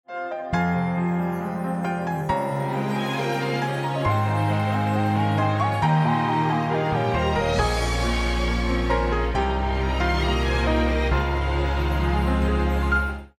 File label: lost round; music kits custom